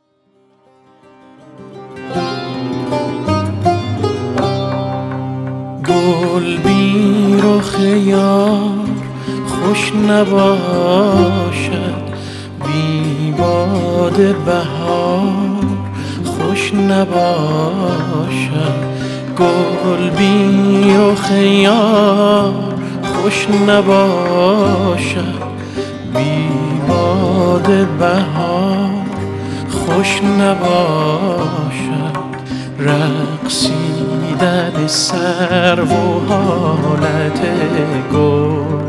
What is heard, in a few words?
vocal